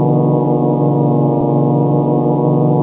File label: elev move, plats